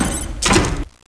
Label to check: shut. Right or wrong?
Right